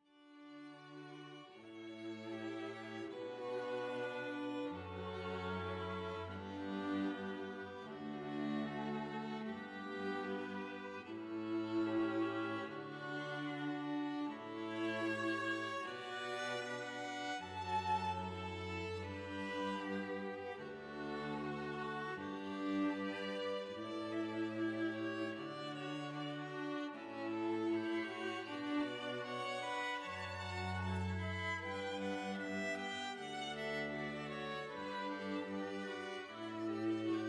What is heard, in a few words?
quartet canon in d